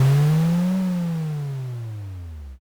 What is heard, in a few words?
dragon